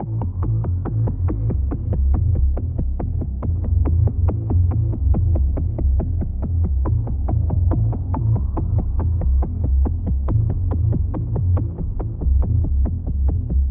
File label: A 140 bpm; immortals